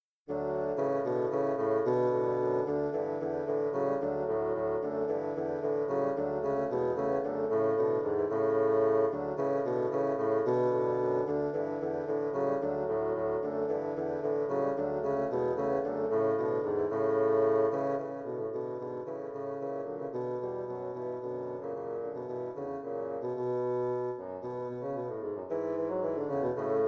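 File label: bouree menuet bassoon trio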